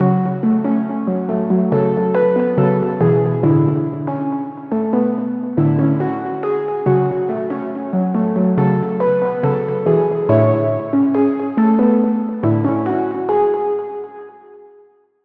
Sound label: Key; Tribulated Path; Routed Sound; Vital Expansion - Street Vanquisher Vol.1